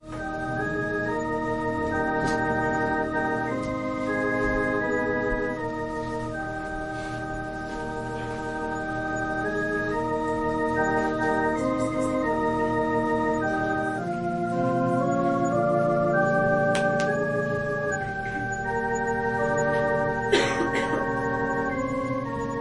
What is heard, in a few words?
Organ prelude